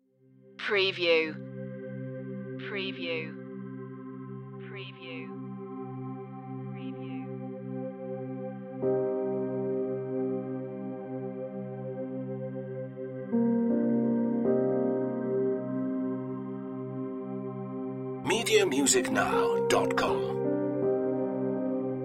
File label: echoes no clarinet